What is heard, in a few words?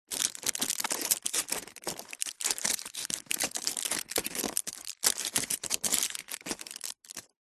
household medicine throat lozenger foil pack handling